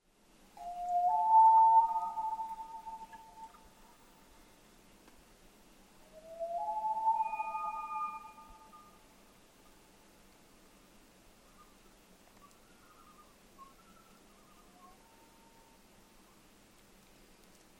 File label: Common Loon Gavia immer